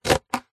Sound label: office scissors single cut card tube